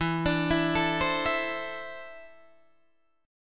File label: E 7th Suspended 4th Sharp 5th, Guitar, DADGAD